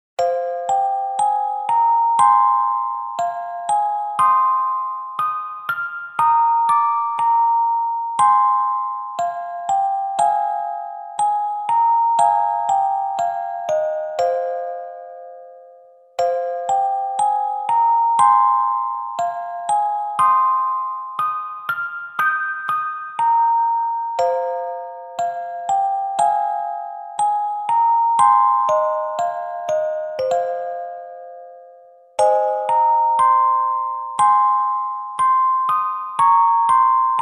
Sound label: Silent Fountain